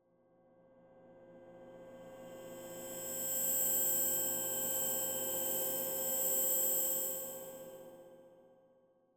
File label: Cymbal Drone Metallic High Pitched Ramp In Tension Pad; Hollywood Edge; sound designer's toolkit v2